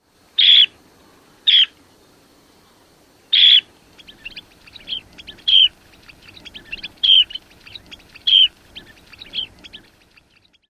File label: pjeev! calls